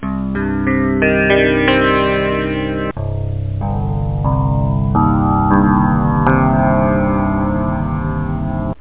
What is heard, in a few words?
chord, guitar; da capo vol1